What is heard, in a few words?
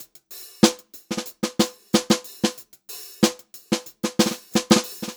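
groovy variation, Funk-Groove Drumloops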